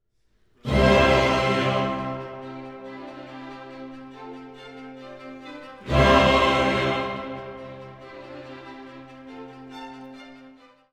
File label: Gloria Ticking